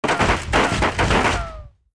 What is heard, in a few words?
bullet